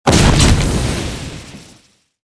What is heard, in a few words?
explosion